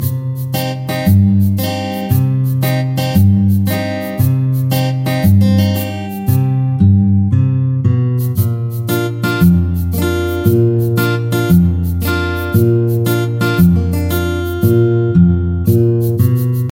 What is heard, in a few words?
Song